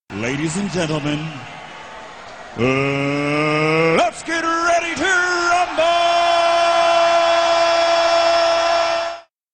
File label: Rumble Start